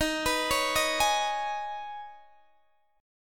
D sharp 7th Suspended 4th Sharp 5th, Guitar, DADGAD